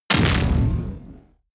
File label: sci-fi crushing burst; schusswaffen